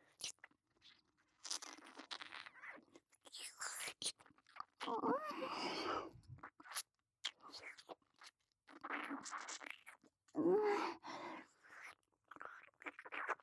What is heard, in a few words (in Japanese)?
完堕ち 耳舐め; リネ